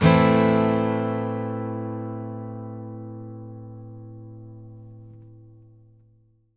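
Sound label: Acoustic guitar A minor down, Country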